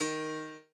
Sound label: piano o5dp